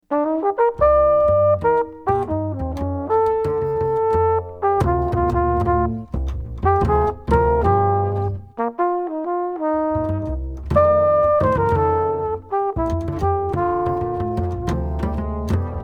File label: flgl melody MIX; flugelhorn solo 2 choruses; out melody & coda